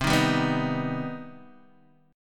C Minor Major 7th C Guitar DADGAD

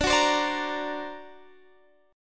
D Augmented 7th D down Guitar Standard; D+7 strummed; JGuitar

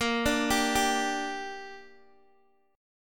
Bb 6th, Guitar, Open D; x,13,12,13,10,x

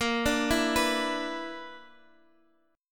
B Minor Major 7th Double Flat 5th A sharp, chord

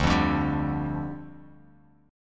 D-Minor Double Flat 5th, D, Guitar, Open F; 2,0,2,2,x,x; strummed

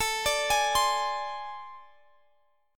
A Minor Major 7th Double Flat 5th A; AmM7bb5; arpeggiated; chordshape; x,x,19,19,21,20

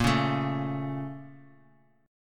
A sharp Minor Major 7th, down; A#mM7 strummed; JGuitar

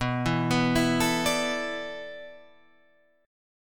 E 7th Suspended 4th

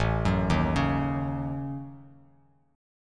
A 6th Flat 5th, Bass Standard, A6b5 chord; arpeggiated; JGuitar

